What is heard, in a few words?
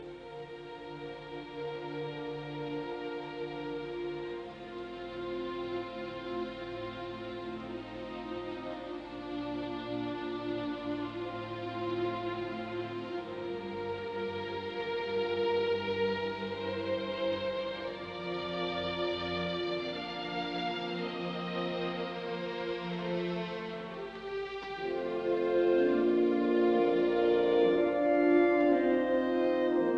Symphony in D minor Choral Adagio molto e cantabile; Beethoven; Op 125